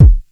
kick, house; 90 sSampleCDs; Crypton - DrumBase5000

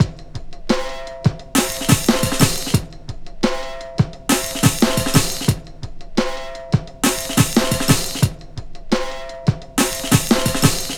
Rugged Break, Drum & Bass, Beats & Breaks

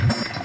Layer Snippet, layering samples Drum Bits

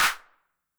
HAND CLAP Reverb Pitch, 909

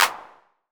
PERC 909; Wizoo - Platinum 24 Electronic; CHR PERC 909

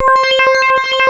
WAV SWEEP C5; Big Fish Audio; Synth City

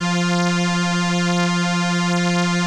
ELKA, String Machines

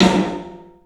PA DEEP L, CLUB SN VRB; 3D Sound; 3D Drums

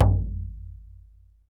BLACKFOOT, American Natives

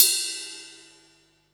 hat, Techno 138 BPM

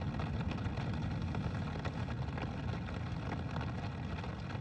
Ambience Loop, outdoor

